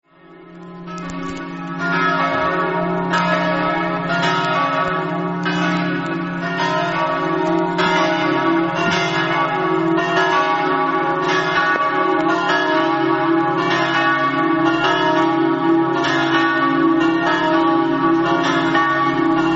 flaibano, campane

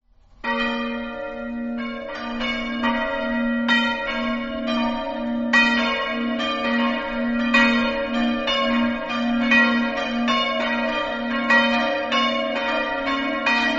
rorai, campane

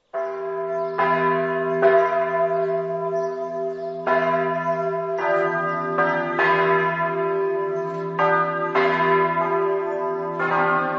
valvasone, campane

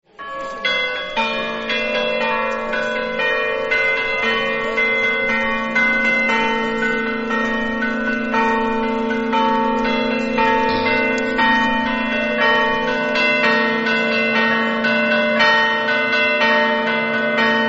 monteaperta, campane

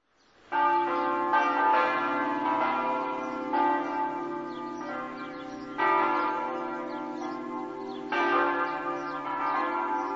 premariacco, campane

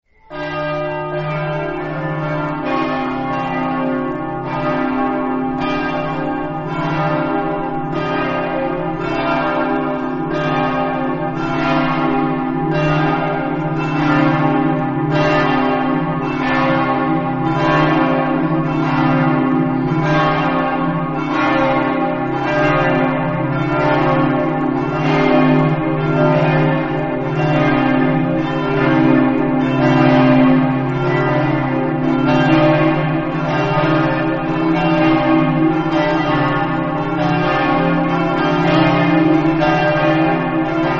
cividale, campane

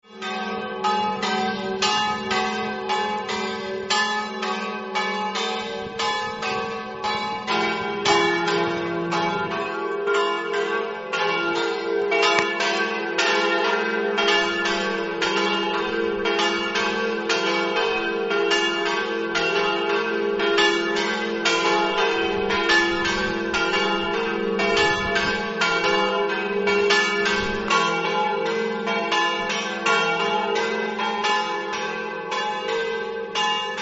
palma, campane

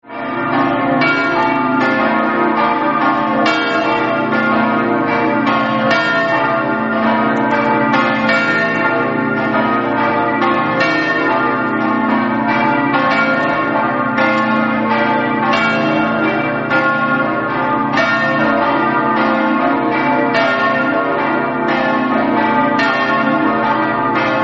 gemona, campane

